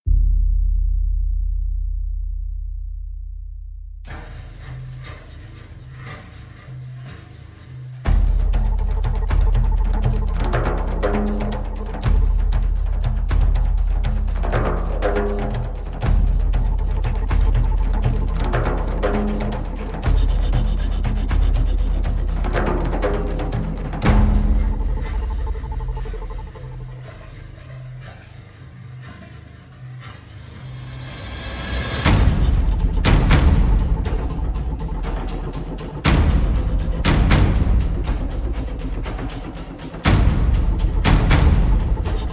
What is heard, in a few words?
perc only